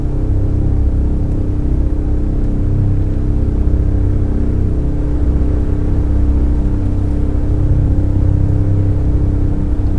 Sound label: Hum